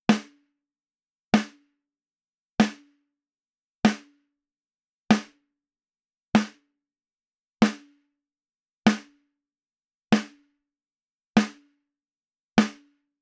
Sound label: Snare WITH AIR BAND at 2.5K SM57